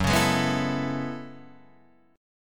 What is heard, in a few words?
Gb Minor 11th Gb Guitar Open D